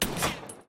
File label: Light Weapon Shot